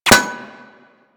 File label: Bullet Impact Metal; Warframe; Weapons